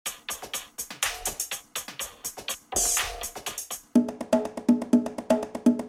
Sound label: Game Music; Brick Thru; 3DS